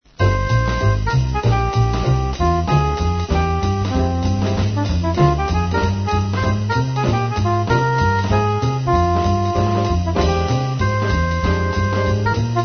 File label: mellow duck